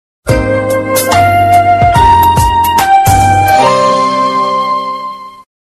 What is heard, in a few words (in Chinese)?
铃声; CCtv铃声